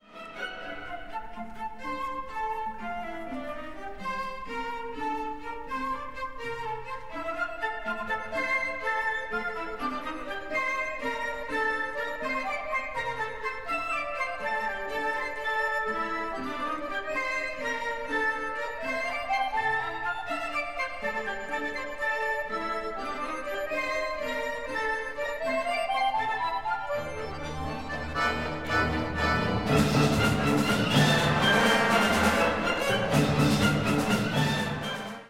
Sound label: accordion